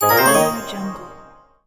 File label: funny level up